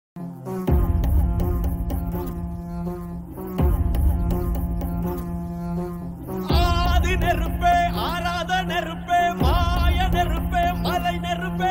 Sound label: BGM Ringtone; kanguva